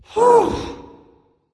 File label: nv sigh; smite; voicepack; xbalanque